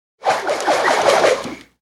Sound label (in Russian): Kinzhal vertitsya rassekaya vozduh